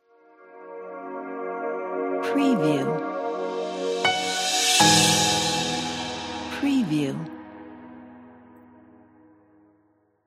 dreamy piano logo

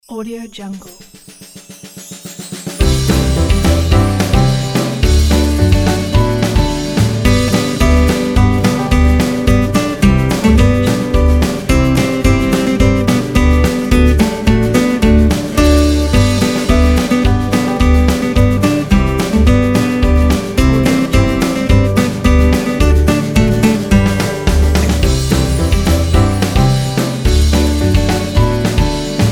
acoustic christmas band

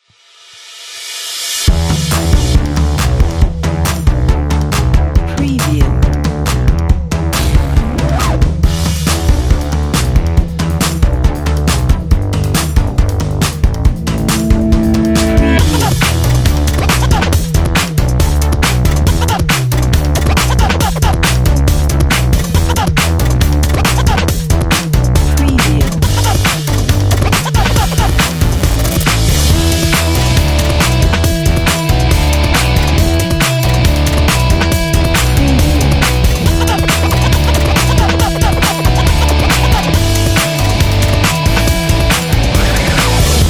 abstract energetic guitar rock

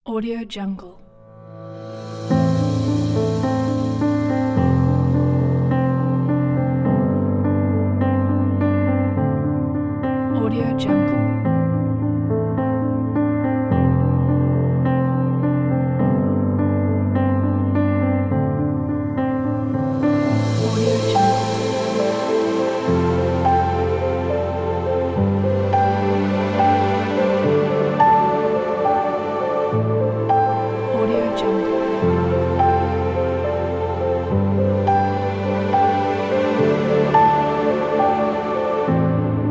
ambient piano

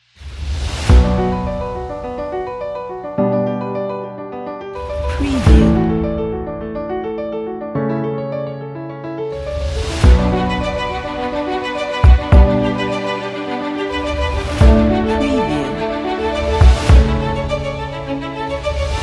pump up inspiration

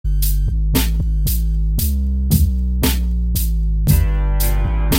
iconic analog drum loops

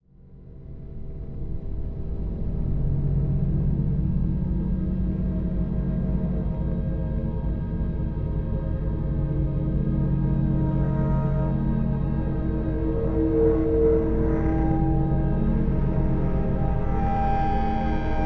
horror sound; unreal engine

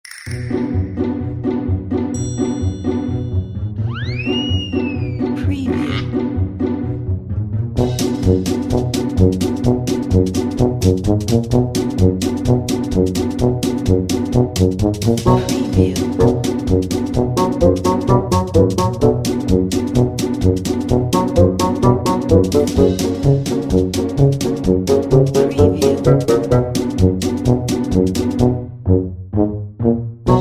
funny upbeat bassoon comedy

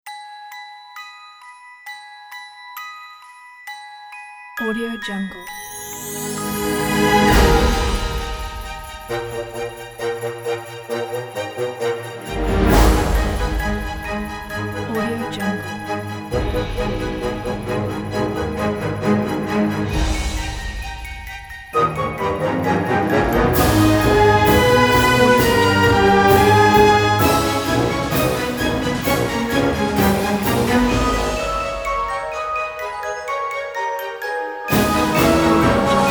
a quirky jingle bells

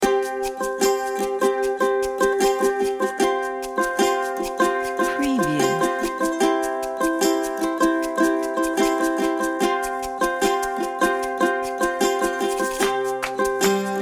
ukulele dreams

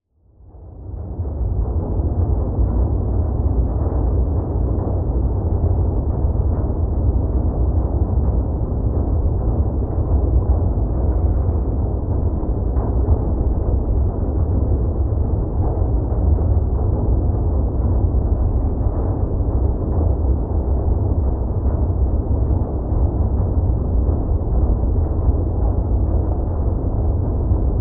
granular layers

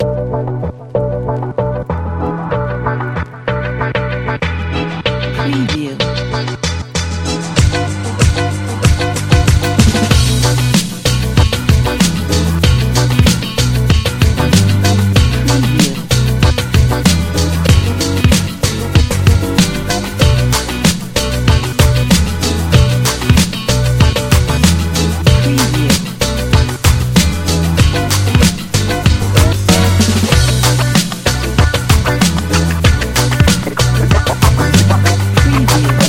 funky hip hop

